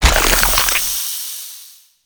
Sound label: Gain Ink Stacks; Gameplay; Warframe; Shadowgrapher